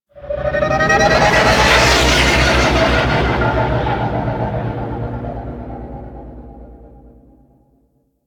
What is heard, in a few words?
Fly By Slow; Nora Liset; Liset Flight